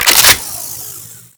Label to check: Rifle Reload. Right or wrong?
Right